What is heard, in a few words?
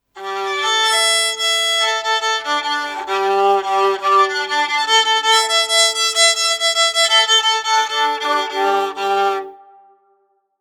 Tuning Violin; Tuning up a violin; Musical